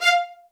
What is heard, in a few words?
MED ATT F5, soft violins; Q Up Arts; Denny Jaeger Master Violin Library